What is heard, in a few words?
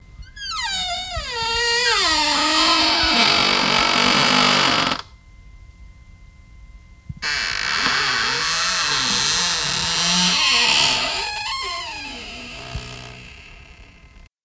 Creaky Door